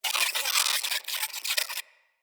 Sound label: Day Close Critters